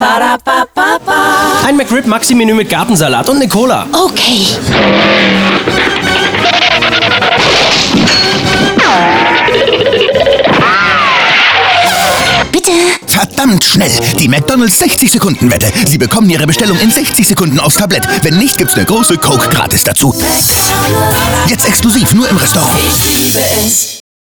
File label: Funk